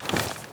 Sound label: weapon movement sprint; weapons